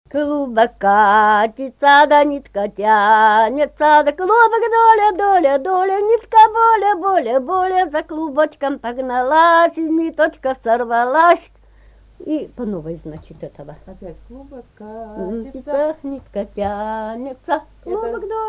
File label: solo